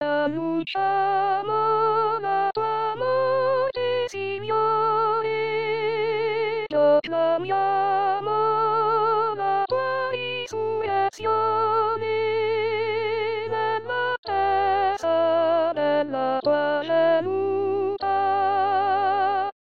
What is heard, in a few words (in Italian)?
Soprani